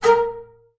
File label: A#4, BASS SPICC; Xsample VOL-5